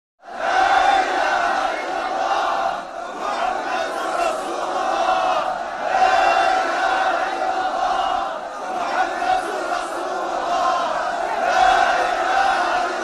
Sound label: Exterior Arab Crowd; arabic